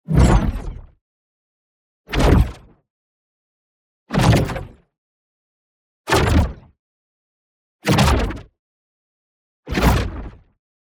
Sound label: ABILITY RELEASE Projectile Riff; boom library; Matter